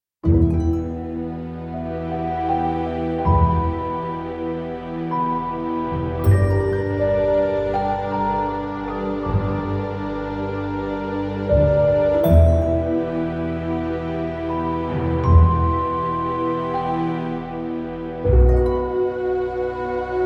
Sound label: Mystery Background